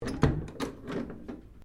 DOOR WOOD INTERNAL ROOM OPEN, Sounds onboard HMAS Castlemaine; Boats; Vehicles